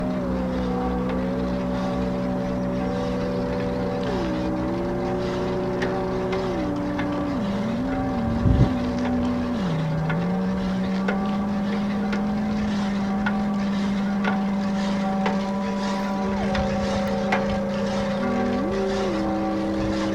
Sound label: bells